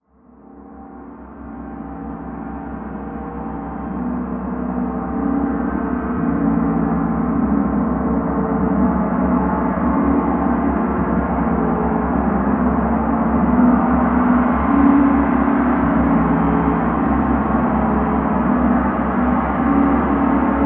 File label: gong; kulturelles zentrum